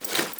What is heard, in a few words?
Foley Action Default Melee Swipe; Characters; Player